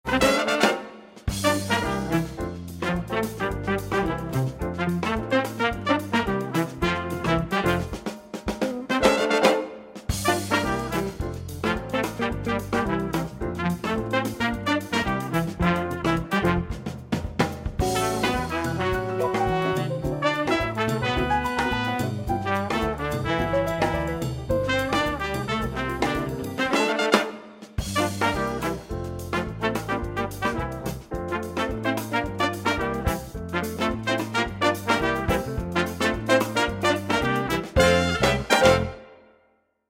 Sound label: Short Fast Latin Track